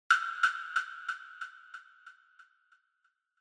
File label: Woodblock, Delay High